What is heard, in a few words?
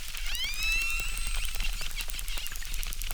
Chirp, acquarium